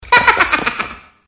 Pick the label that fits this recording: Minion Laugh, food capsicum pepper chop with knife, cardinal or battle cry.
Minion Laugh